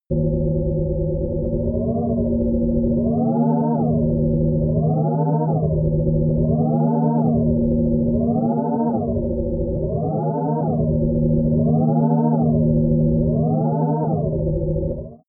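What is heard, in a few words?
emo ghost fifth, pads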